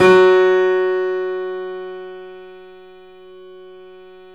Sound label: 3D Pianos Yamaha C7; E-MU Producer Series Vol. 5